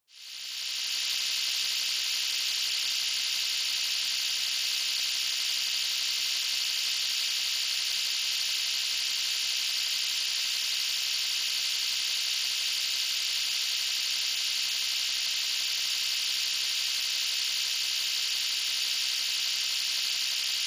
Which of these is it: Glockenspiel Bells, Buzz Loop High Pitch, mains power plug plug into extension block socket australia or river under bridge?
Buzz Loop High Pitch